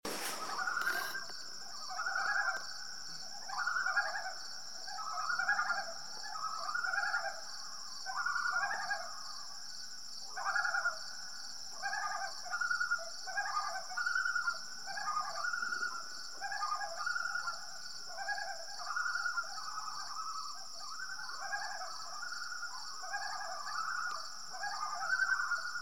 Dueling Loons at Moskey Basin; Isle Royale; cleaning up

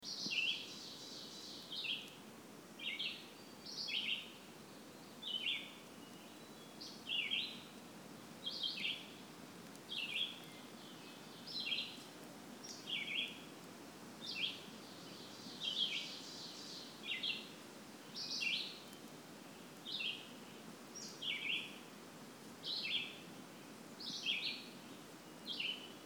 Bird Chorus; Jay Mountain Wilderness; Derby Brook; Jay Mountain Wilderness 2014

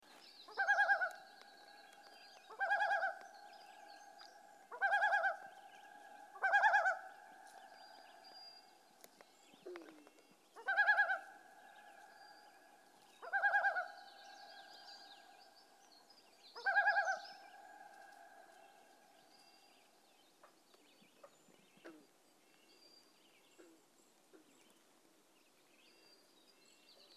Common loon at Crooked Lake

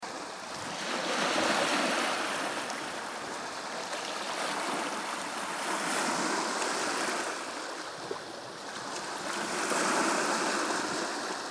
Rainbow Cove Waves; Isle Royale; moose of a morning

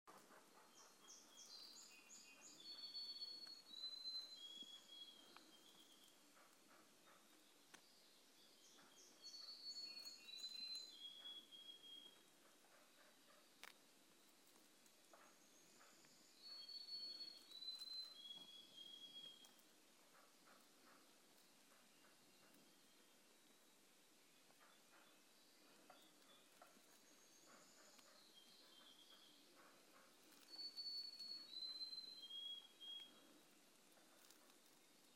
Morning Chorus at Crooked Lake

